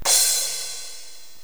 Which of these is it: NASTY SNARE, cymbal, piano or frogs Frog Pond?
cymbal